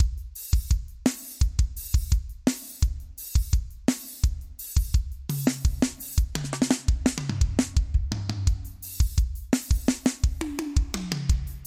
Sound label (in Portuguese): Forró Romântico